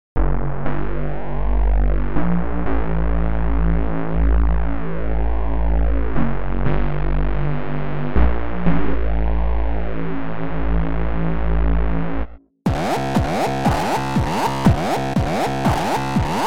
bass slow

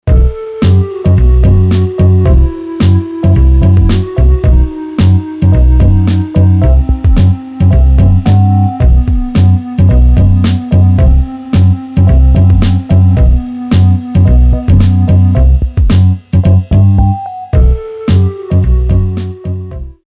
Alesis Fusion P Modeling

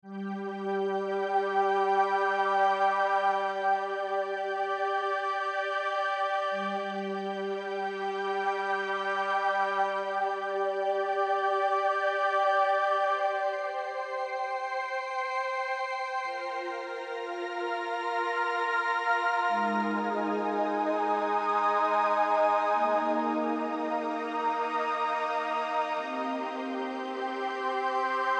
Cold Solina

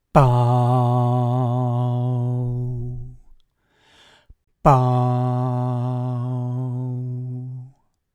Hornet Color

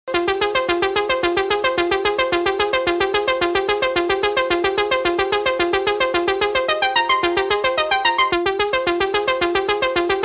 Future Retro Arp

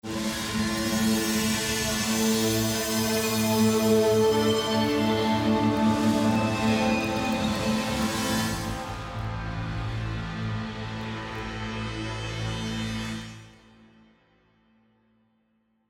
Evil Foundations; SD Designer

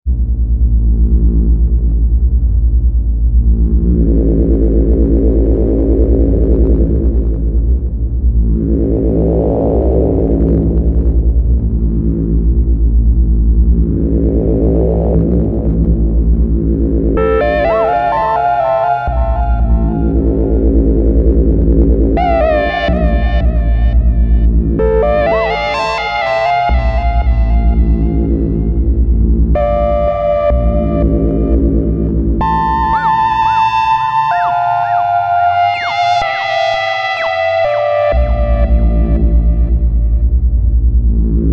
Buchla Easel V Modulated Lead; Arturia

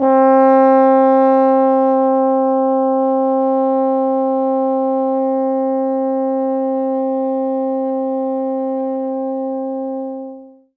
Alphorn Fade Out

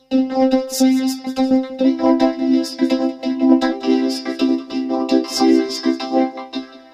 vocoder

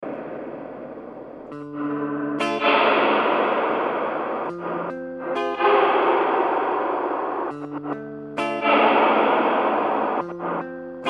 Swell

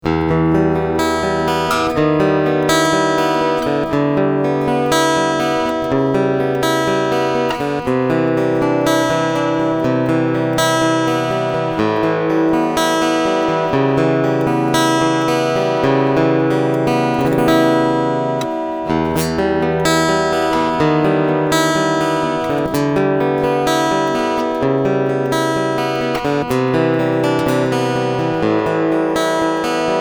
Picking Mid Contour

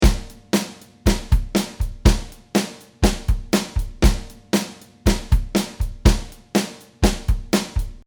120 Basic Soul dry

